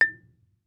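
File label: Marimba rubber fortissimo A2; MIS Pitches - 2014; Percussion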